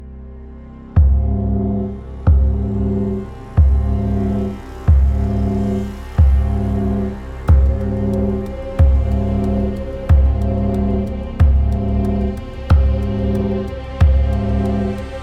hybrid groove, ritual gong drum; riot audio

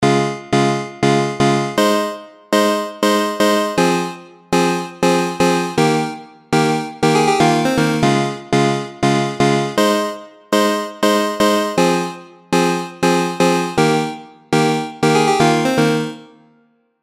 chippy lead

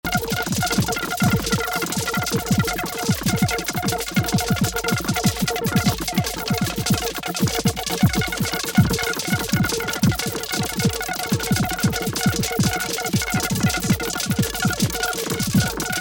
drums grainbrain; grainbrain by xnullx

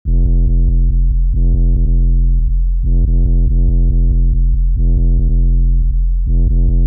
bass processed; punish

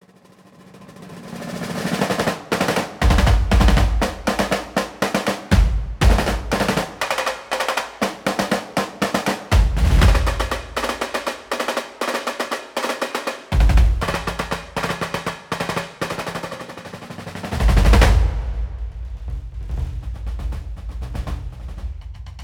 crazy march, alternative snares; schallenberg engineering

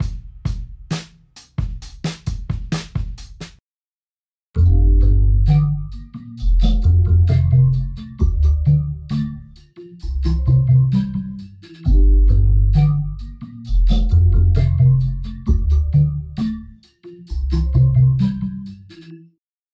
drum bassline; aava; viiri audio

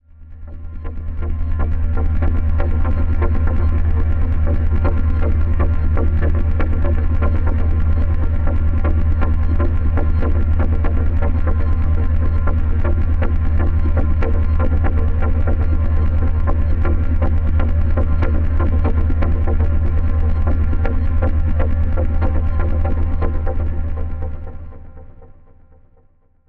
gravelly rhythm; wonder; zero-g sample libraries

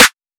phonk snare; phonk toolkit; rocket powered sound

